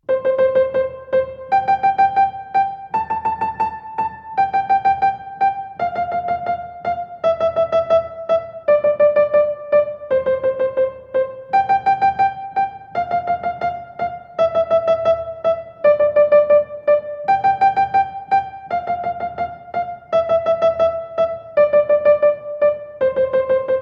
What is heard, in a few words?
Twinkle Twinkle Little Star - Variation A right hand only